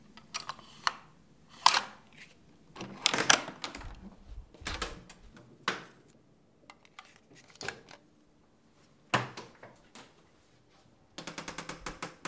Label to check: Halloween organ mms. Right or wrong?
Wrong